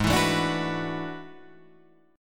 E 11th Ab

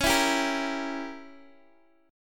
C sharp Diminished 7th C sharp, Guitar Open D; down; chord shape

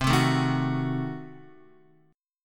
C Diminished 7th C, Guitar 4F,4A,5C,5G,5C,6E; strummed; JGuitar

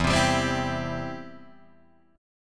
E Minor Sharp 5th E down Guitar Open G

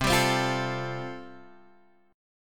A Minor 7th Sharp 5th

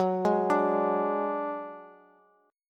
Gb Suspended 2nd Sharp 5th, Bass; arpeggiated

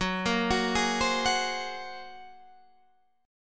A sharp 7th Suspended 2nd Sharp 5th

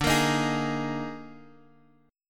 D sharp Major 7th Suspended 4th, D

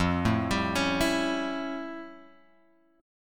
F Augmented Major 9th F Guitar Open F; F+M9; arpeggiated

